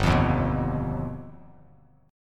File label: G Augmented 7th, Bass Standard; G+7 strummed; 3,8,1,4; JGuitar